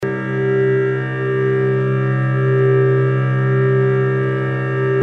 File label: microtonal variations of the all-interval-chord; akkord 1 c g e-1 b- cis-1 fis-1